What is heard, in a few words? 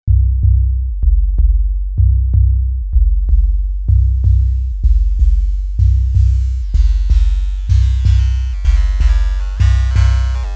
Korg Volca Drum Bitcrush